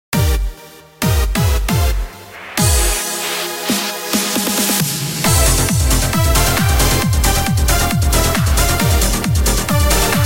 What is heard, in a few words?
Bossa Lounge -Dance Remix-; Neptunia Virtual Stars